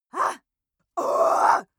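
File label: female angry, screams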